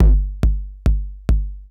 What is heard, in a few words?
KICK TECHNO 140, Techno Drum; Techno2